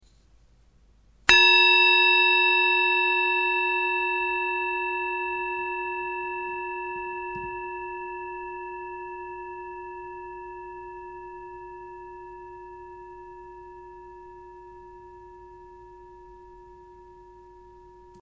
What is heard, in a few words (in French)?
bol chantant